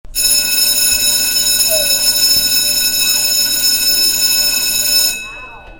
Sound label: School Bell; FXHome